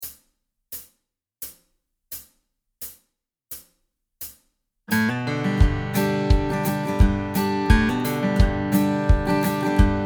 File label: modern country track